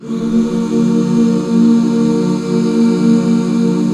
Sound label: EB MIN; Best Service Gigapack 1